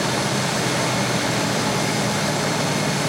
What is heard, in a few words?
t90a engine rpm 66, tanks; engines; War Thunder